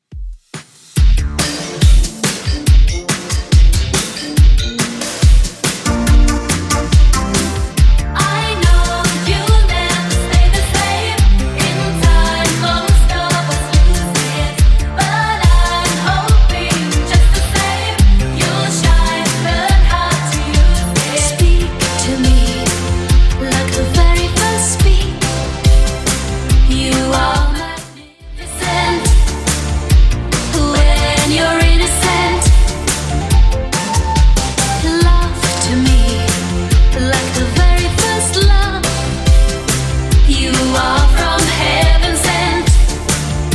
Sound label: 80s Redrum 141bpm